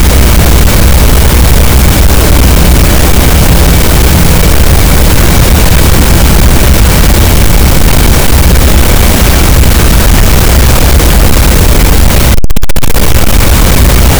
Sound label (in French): cachalot, gaussian noise; Physeter Macrocephalus